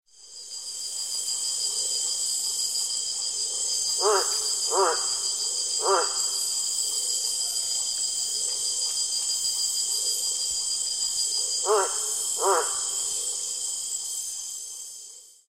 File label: Milk tree frog